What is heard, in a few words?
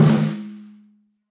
Snare Press; Snare Articulation; Drum Hits; WarmAndLow; Session Drums Club; Standard Hits; Ableton